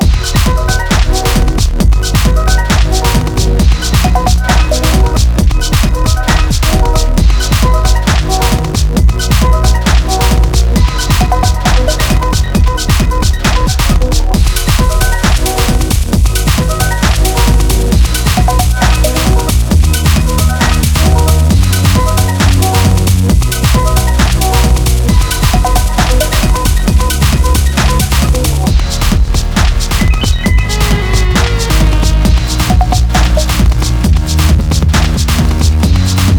drums only

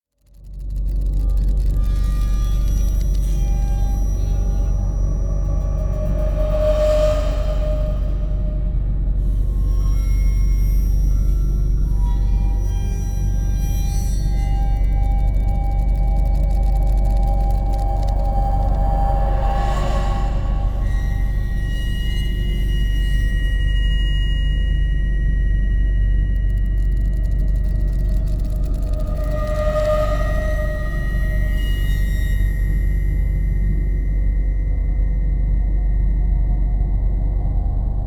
drone metal scream

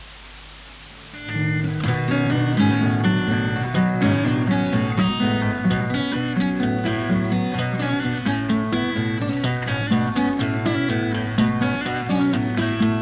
guitar instrumental

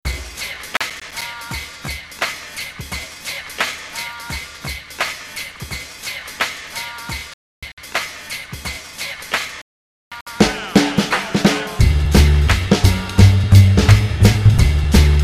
swing bells

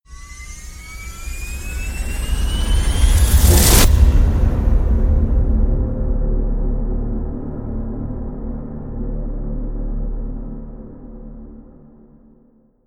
hit tonal up and locked

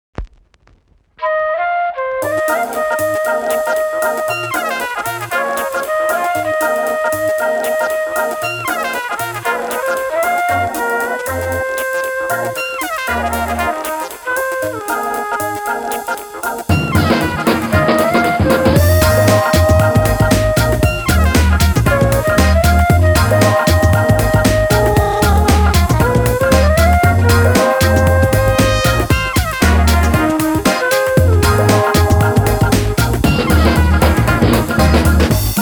flute

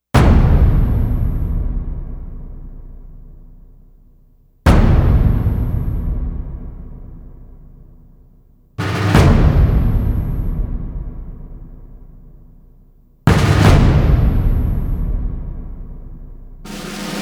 drums

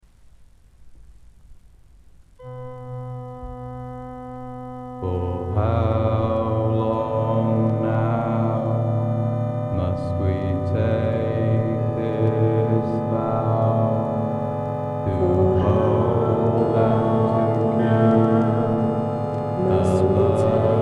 wedding bells

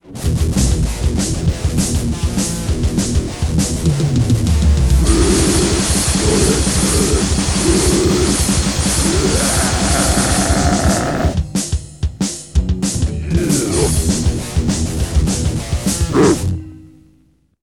thrash metal

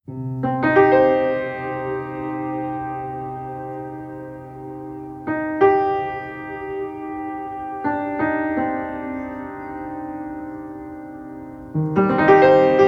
piano and ambience

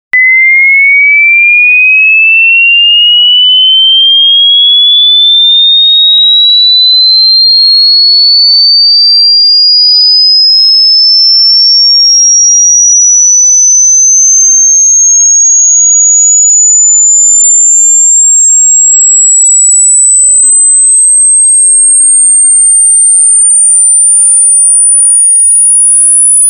2 khz 20 khz

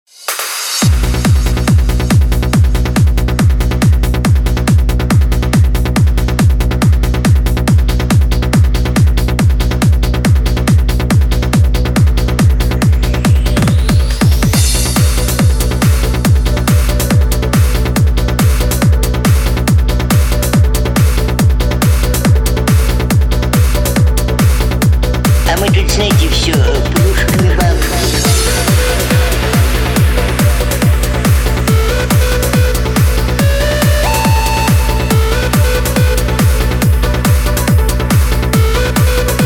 trance

